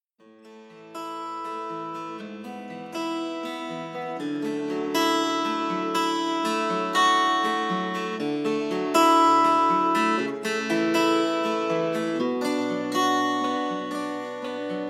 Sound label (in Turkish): Huawei P20 Pro Zil Sesi